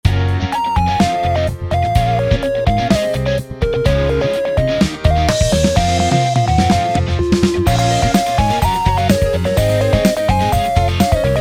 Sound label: Drums Half